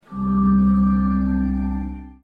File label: box Glow